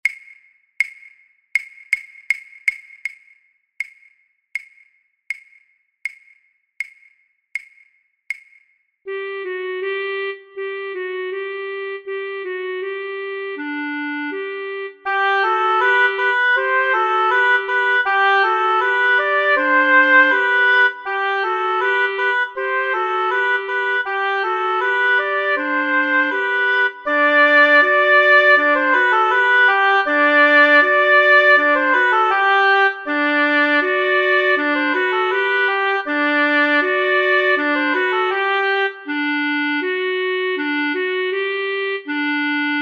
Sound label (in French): lent sans claves; Gavot